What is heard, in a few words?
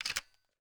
m3s90 load